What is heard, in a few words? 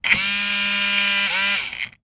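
toy remote control car turn wheels tilt motor whir still upside down; zapsplat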